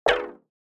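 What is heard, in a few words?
btn skip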